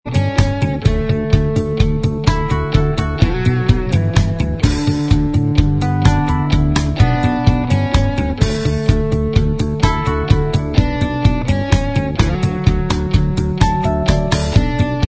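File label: remix, instrumental, backing track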